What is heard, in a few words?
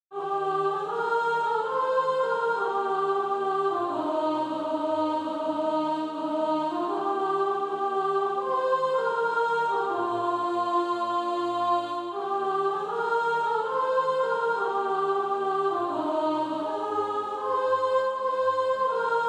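soprano, Practise Music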